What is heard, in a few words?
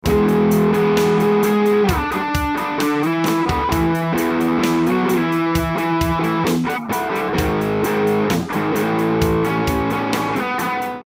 Packrat OG; JHS Pedals